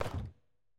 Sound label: library puzzle close